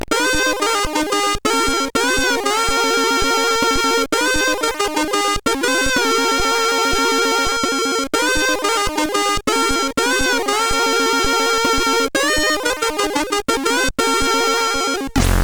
Corny Jingle, CSG8580R5

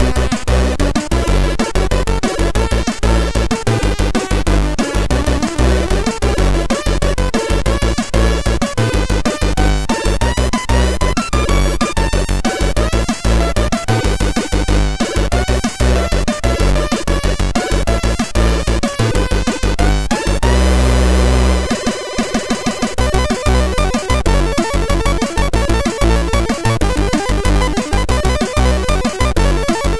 Snare Intro, MOS6581; MCA

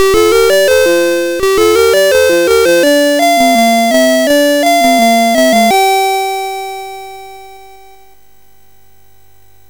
sid MOS6581R3, GAMES; Alice In Wonderland

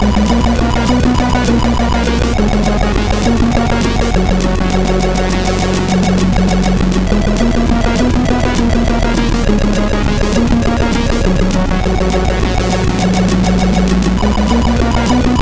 sid, MOS6581R4; China Escape

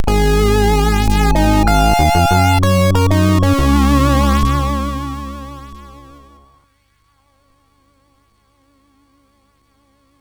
Game Music, MOS6581R4

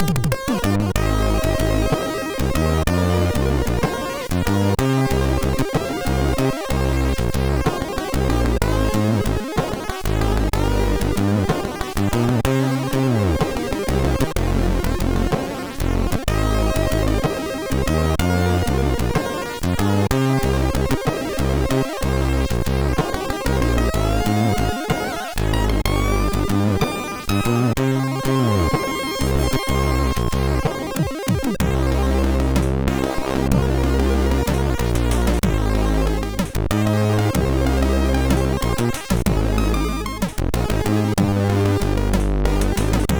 Soft String, sid MOS6581R3

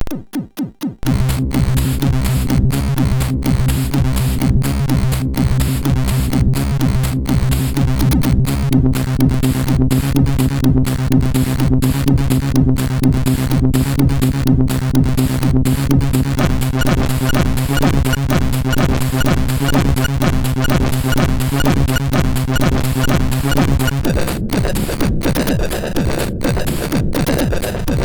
Techno, MOS6581R4; Techno 2 Strange

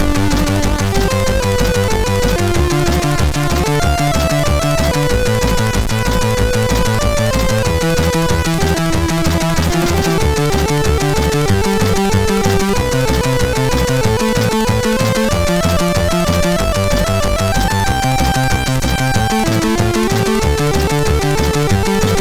Splash Wave, MOS6581R3

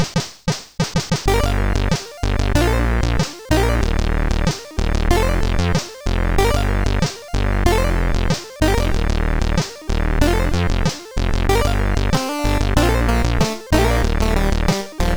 Relaxation, CSG8580R5 sid

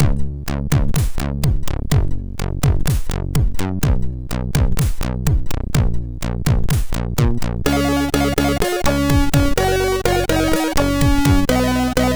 MOS6581R2 sid

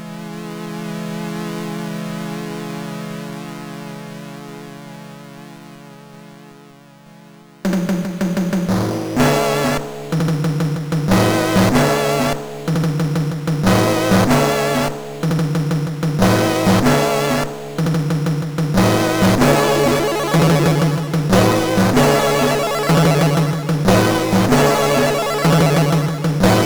Orchestra, sid MOS6581R2

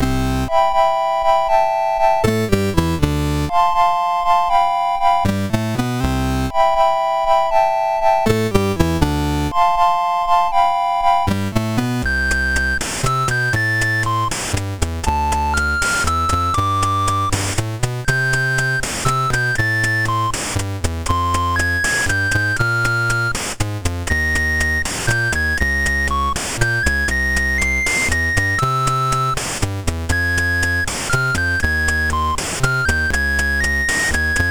Ballad, MOS 6581 R2

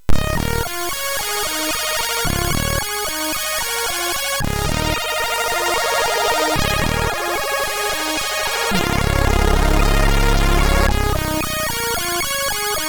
Snare MOS6581R3

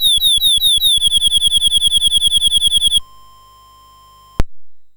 Bird, MOS6581

